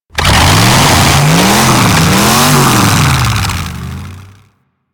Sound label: Saw Idle Rev, Melee Weapons; Warframe; Grn Ghoul Saw Player